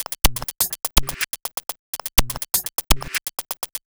Robot Thunder, Modular Electronica; Sonic Foundry (Sony Creative Software)

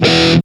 METAL POWER; East West; Global Sounds

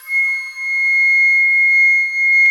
FLT XFLT, Flutes; Club-50 - Foundations Roland

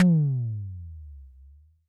TD-20 Tom B, Roland TD-20 Toms

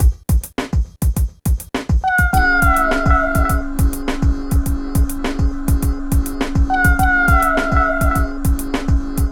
PHATT BEA, Hip Hop

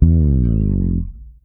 FRETSLIDE, PEDULLA BASS; Masterbits CLIMAX 7 - Bass

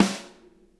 snare, drum kits

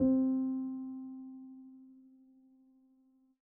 Piano - C; Instrument Oneshots; 99Sounds; Monosounds

